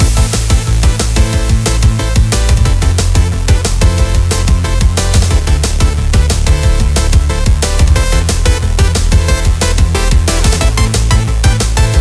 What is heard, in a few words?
level